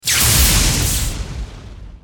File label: thunder